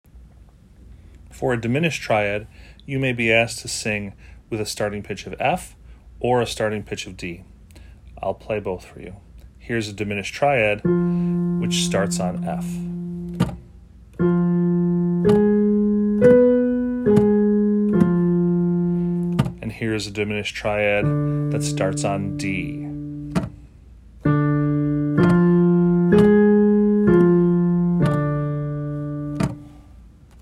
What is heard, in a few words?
Diminished Triad starting on F and D